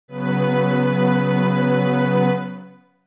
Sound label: B Suspended2